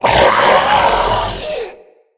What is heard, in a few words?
Infested Femur Shoulder Fire Start